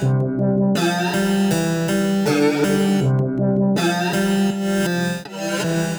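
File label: Harpsichord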